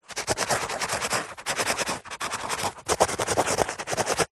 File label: pen ballpoint writing on tissue paper; office